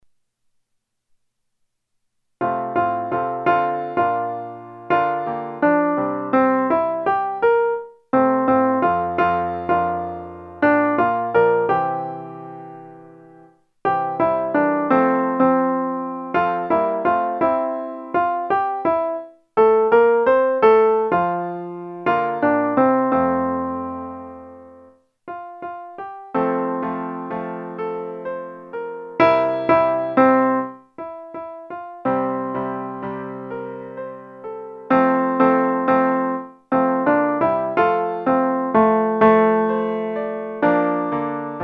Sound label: alto instrumental